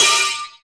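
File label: PER PROCU, Procussion, percussion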